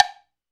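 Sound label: woodblock high low; Drums; Ableton Foundation FreePacks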